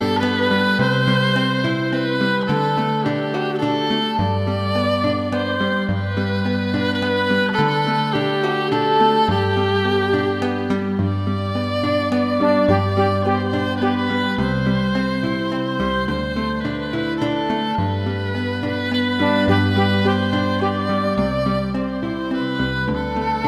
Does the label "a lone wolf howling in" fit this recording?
No